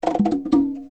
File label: conga